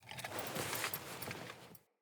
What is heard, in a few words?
Foley Action Default Reach, Default Clothing; Player